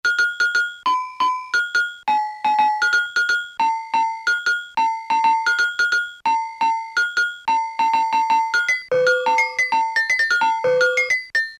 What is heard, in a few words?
AGOGO, Casio Rapman; Tones